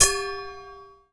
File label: BRUSH BOWL; Rarefaction; Poke in The Ear With A Sharp Stick